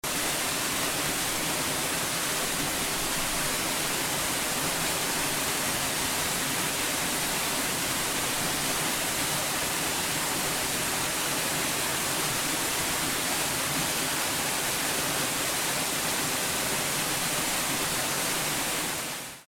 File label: white noise